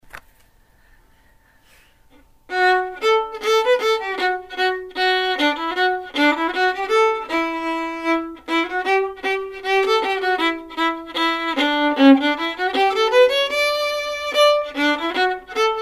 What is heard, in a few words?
polka d, fiddle